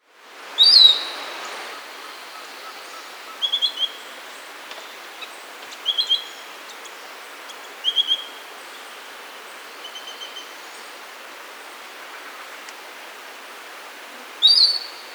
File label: Piratic Flycatcher (Legatus leucophaius), Bolivia; S. America